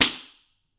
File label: Book Slap Good Hit